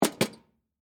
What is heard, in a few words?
Lunch Box Set Down, Foley, Lunch, Box, Set, Down, Metal, Case; Set Downs; BlastWave